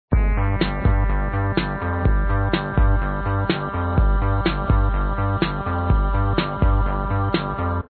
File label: Ambient BGM; online; Divine Intervention